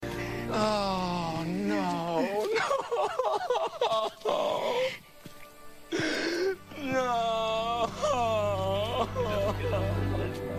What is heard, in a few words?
crying